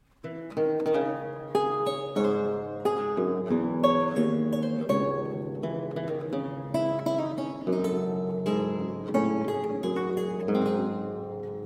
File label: Bouree in D Minor, Lute; Anton Logy